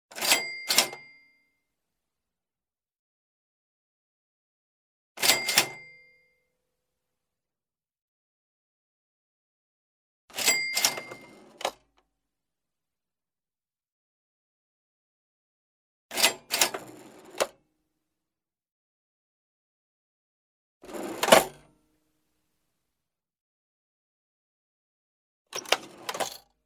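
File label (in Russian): различные звуки старого кассового аппарата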